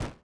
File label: step forest